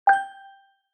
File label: updates notification